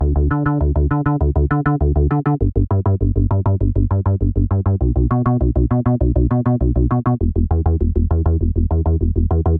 D Bass Simple Slapback, 100; Zero-G; Future Wave

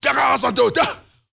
MALE RAVE, MALE NOISE, Heart of Africa; Spectrasonics; Heart of Africa 1

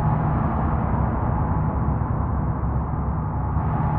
sparse soundscape samples; ReLooped

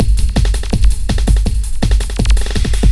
LOOP, Eurotech; Sounds Good

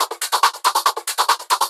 Loops 140 BPM Hihat Percussion Loops

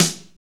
SNR, Snares; AMG; Now CD-ROM (Roland); NOW! Drums

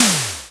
MS-20 Snare; Digital Sound Factory

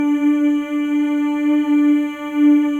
MALE MMS, Symphony of Voices; Spectrasonics

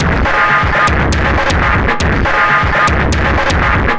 Drums Strange

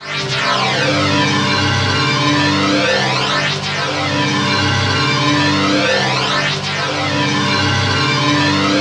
BASS SLABS; Zero G; Malice In Wonderland

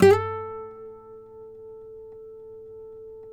FLAMENCO NYL, Guitars; Spectrasonics; Hans Zimmer Guitars VOL-2

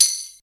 tambourine, Yamaha TG-500

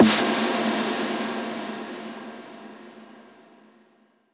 Impacts, Effects

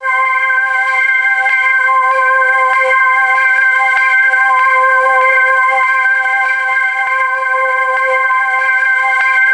C5, Synth Leads

